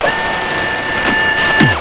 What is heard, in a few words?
Conveyer belt noise